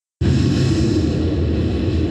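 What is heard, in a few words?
Alien amb; Resurgence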